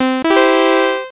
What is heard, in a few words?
pack spawn; cheesy-pirate; sailingWithSharks